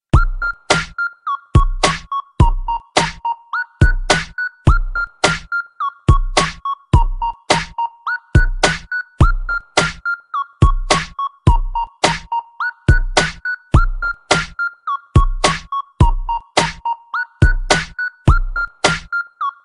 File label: bgm; breaking bad